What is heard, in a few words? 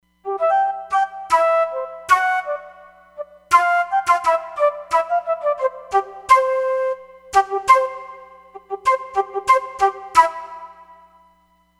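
Attack Flute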